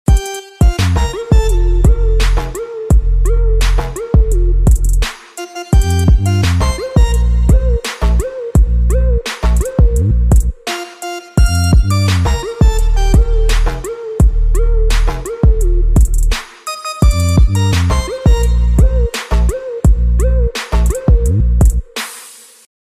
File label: Birthday Ringtone; Fortnite